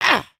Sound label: Attack, creatures organic